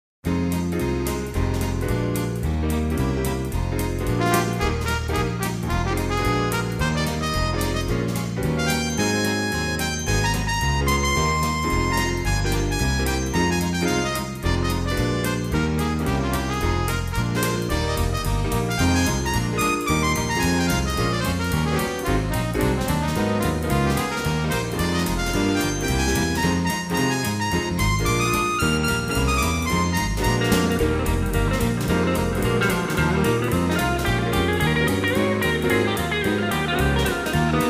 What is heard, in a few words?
Jazz Jump